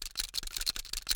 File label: deagle special lookat; arccw go